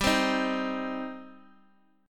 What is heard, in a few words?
G Suspended 4th Sharp 5th G down Guitar Standard; strummed; JGuitar